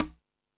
Latin Drums, E-MU Pro-Cussion; drum machine

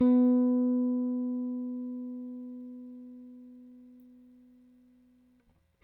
RickThumb-A, RickenbackerBass; Airwindows

